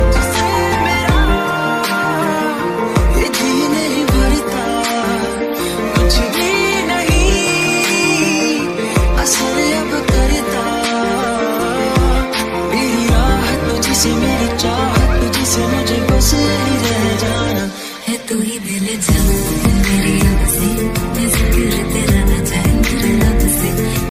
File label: new hindi sad music ringtone, bollywood